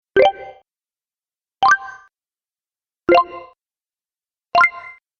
Positive Win Mini Bonus Collection, Video Game Sound Effects